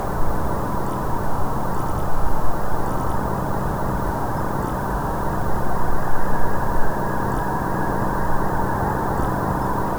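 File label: very distant human activity, night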